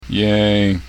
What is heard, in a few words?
yay